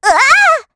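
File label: Vox Attack, Korean; Estelle; kingsraid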